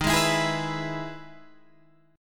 E-Major D sharp

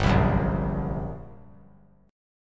G Minor Major 7th Double Flat 5th, G; strummed; JGuitar

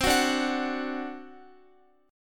C Suspended 2nd Flat 5th C Guitar; Csus2b5 strummed; x,x,12,11,14,14

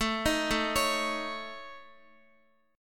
A Major Flat 5th, Guitar; arpeggiated; guitar chord

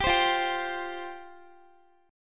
F Suspended 2nd F Guitar; Fsus2; strummed; x,x,17,17,17,14

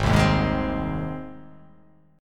G sharp Major G sharp, chord

